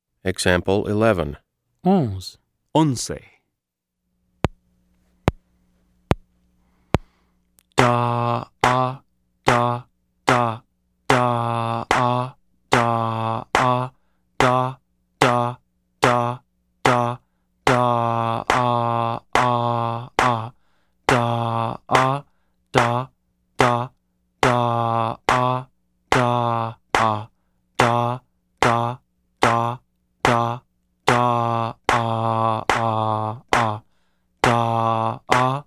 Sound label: Keyboard